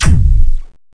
fire laser Auto

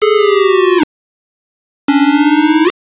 Scrolling; drol sg 1000

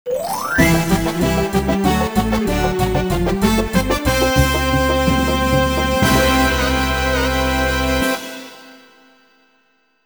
1st Place; Mickey's Speedway USA; N64

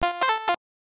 Credit Sound; hopping mappy original video game sound effects

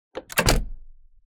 Door Close

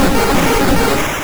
Lick; Pokemon; Gen 1; attack moves; RBY

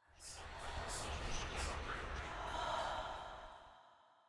Hotel Whisper; silent hill homecoming 2008 gamerip pc ps3 x360

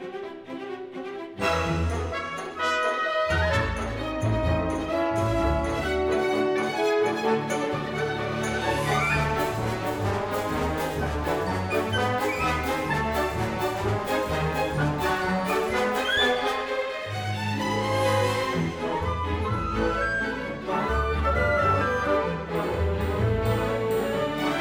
Glacier Music (Active); Kinectimals; Android iOS Xbox 360

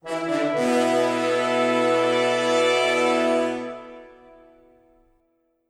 Music Fanfare; Fable Anniversary

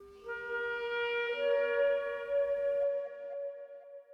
Arp; Proteus

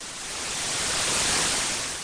Snow Sound

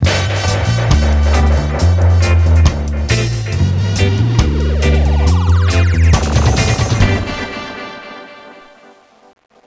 Music Volleyball

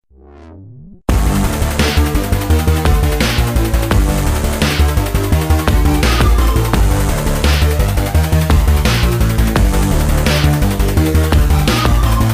boss song ending; Cally's Trials

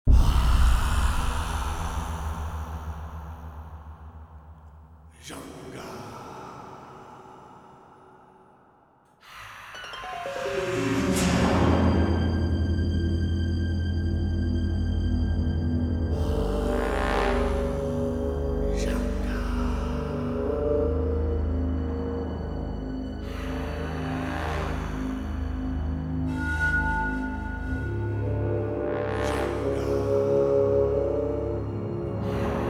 Interior Ruins Ambient

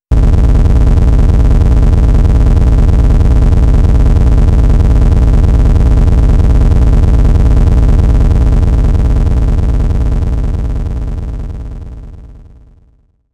Player Tank - Moving

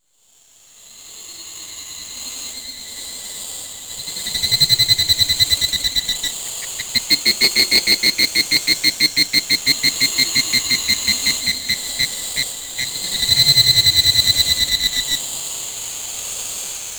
Cricket Ambience

